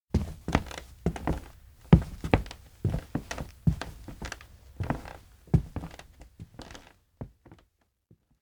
steps indoor school hall walk creak; anemoi パッケージ版 1.1

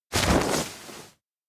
cliff jump; aino; anemoi

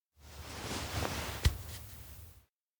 hold hands; anemoi

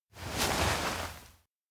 turn slow; spica; anemoi

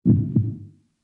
pulse heart; anemoi

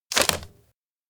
paper shake slap floor; anemoi

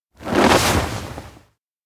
snow leave; anemoi

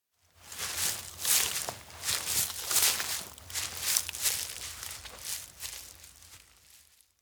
steps outdoor grassland walk away; spica; anemoi

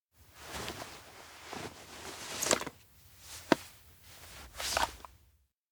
bag takeout bento spread; anemoi

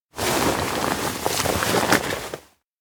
tent unfold; anemoi

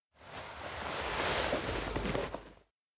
box reach in careful; anemoi

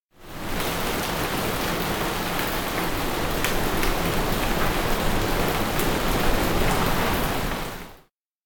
heavy rain pound; anemoi

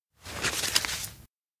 takeout pen memo; anemoi

